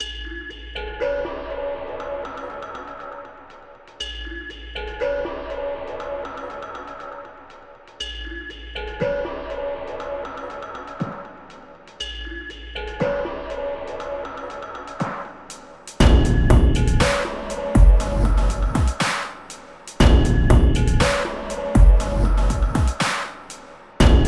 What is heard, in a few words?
Other Worldly HipHop